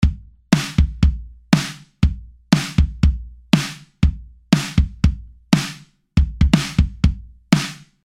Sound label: drums dry